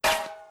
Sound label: Bullet Metal; HapticSamples; Feel; NiceVibrations; Impacts